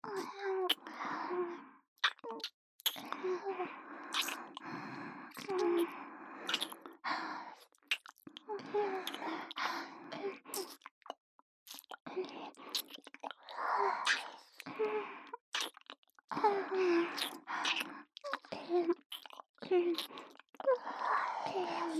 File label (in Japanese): 唇でWキスループ; ミアシアと唇でWキスループ; ASMR